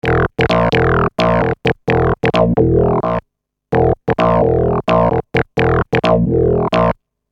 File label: Syn-Bass m E, Techno Synth Loops, 130bpm; Sonic Foundry (Sony Creative Software); Signals I